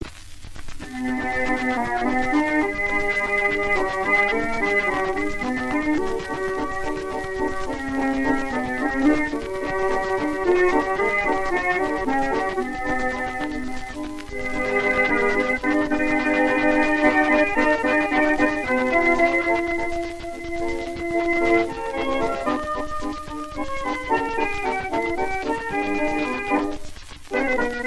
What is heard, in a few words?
Over the Waves Waltz